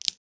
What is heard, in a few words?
wood breaking